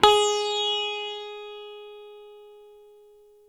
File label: SITAR G#3, CORAL SITAR; East West; Steve Stevens Guitar Samples Collection